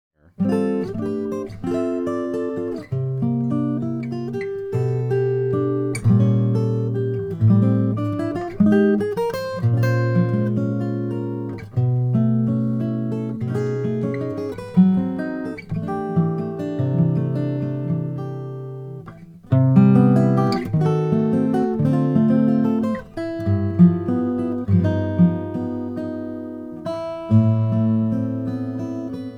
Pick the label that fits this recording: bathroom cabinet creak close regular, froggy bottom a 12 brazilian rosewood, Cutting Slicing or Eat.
froggy bottom a 12 brazilian rosewood